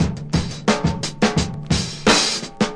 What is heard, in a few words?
174 break, DRUM LOOPS and BREAKS; 161 to 180 bpm